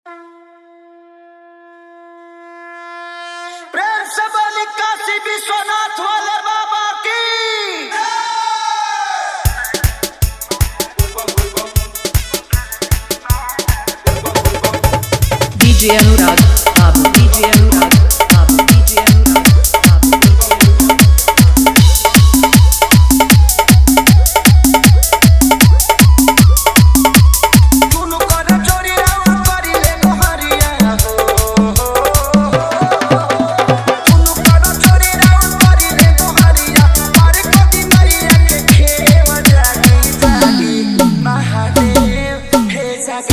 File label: Full Hard Dhollki Bass Mix